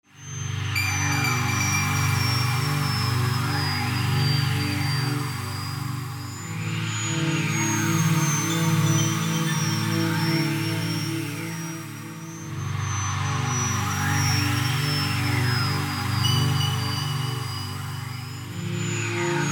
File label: Atmo The Fog Stock Preset; Electra